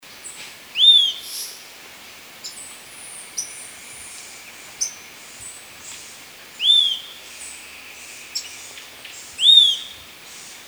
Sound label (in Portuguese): canto do piui de topete